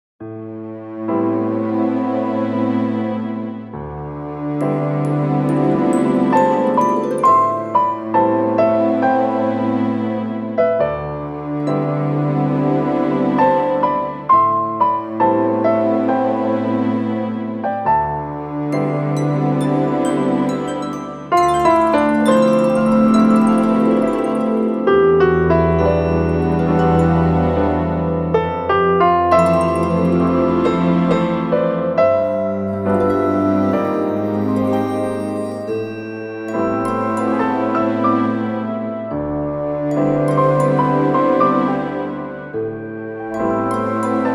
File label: Night Am; 4/4; Piano + Woodwinds + Strings; Night 1